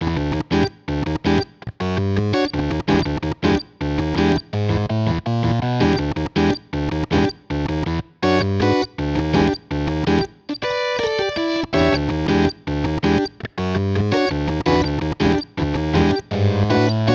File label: The B Amp Plexi, electric organ; electrickeys